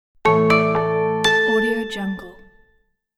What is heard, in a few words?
short bright modern logo